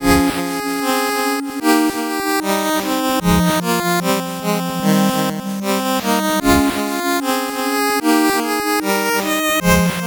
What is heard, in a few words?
8bit bgm normal